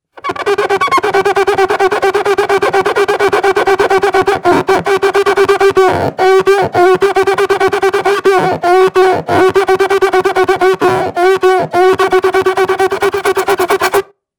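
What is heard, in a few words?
balloon sound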